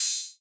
ride, cymbals, drum one shots

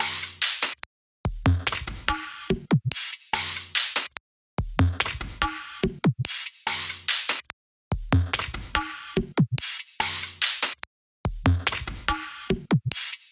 Drum Loop, 144 BPM Percussion, Future Bass; Loop Stems; Cymatics; GHOST (Beta Pack); Brisket

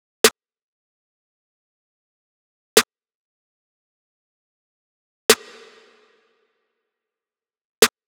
Drum Loop - 95 BPM Snare; Loop Stems; Cymatics; Vibes II Drum Loop Collection; Ultra

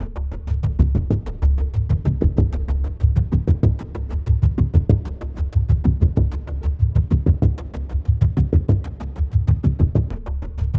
Percussion

